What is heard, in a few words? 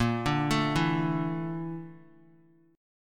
A sharp Major 7th Flat 5th, down, Guitar; arpeggiated; 4F,4A,5C,5G,5C,6E; JGuitar